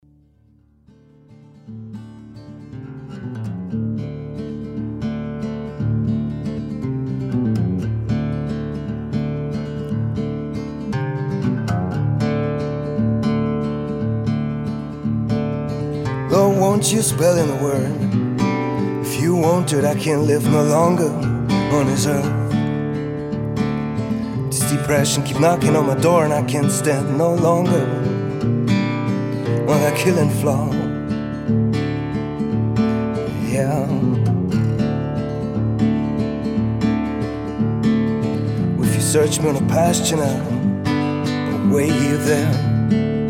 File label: folk-singer